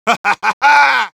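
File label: laugh happy, Spanish; Heavy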